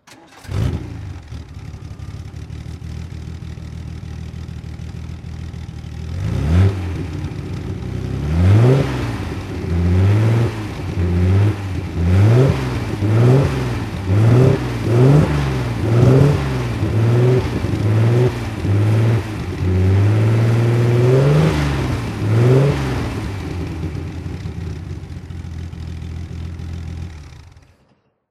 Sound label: healey 3000 MkI race throttle